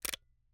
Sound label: Camera, Kinusa K77, 50mm lens, plastic, serrated, lens adjust, slow; Eiravaein Works; Vaeyan IV